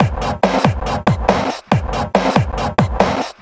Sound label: Break Beats, 140 BPM